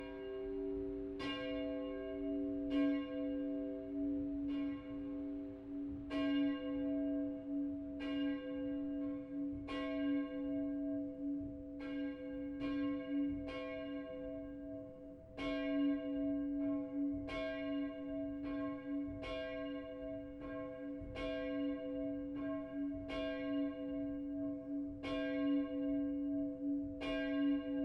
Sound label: bell north heiden churpoetsch; bells